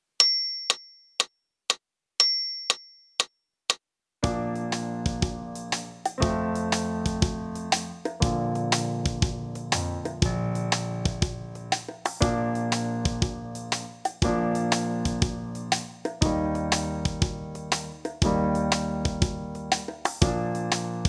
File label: Impro 3 chords in Bb, brass